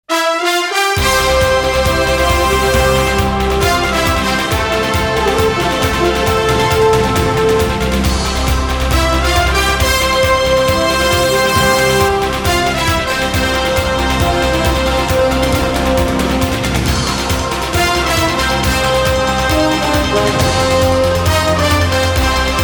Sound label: brass bang